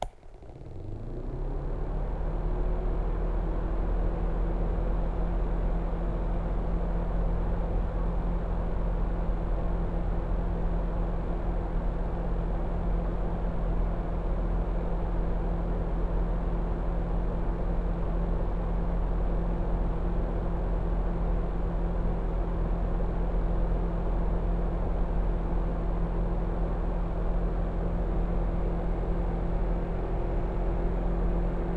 minifan; devices accessories utilities; NS Studios - ultra sound collection

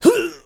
Hit

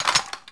Gun Handling Weapon, Weapons Foley; SFX Kit

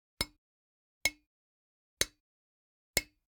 Generic Click Glass Small

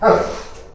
dog; Apotheorasis

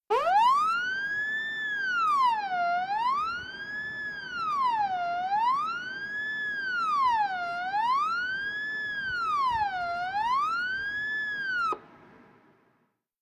Fire alarm; KMP Film Video Sound Effects

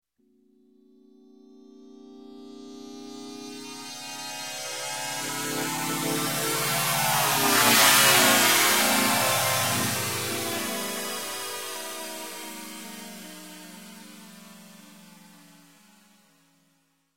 Space Space Fizzle, Pan By, Electronic; Sound Ideas 2000 Series

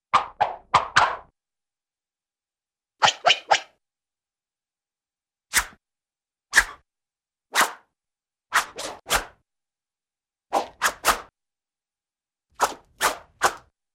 Various Swishes; Cartoon; Hollywood Edge; Cartoon Trax

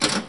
Pinball Bumper

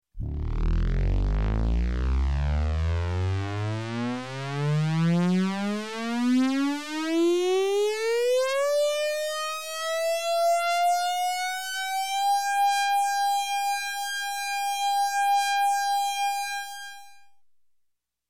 Space Air Raid Siren, Flat, Electronic; Sound Ideas 2000 Series

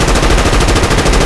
Assault Rifle Shot Loop; SFX Kit; Weapons

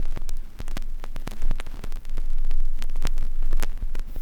CRCK, Production Effects

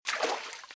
Footsteps Water Ankle Level; RadwinIsland

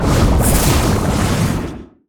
Super Spell Impact; AudioWizards

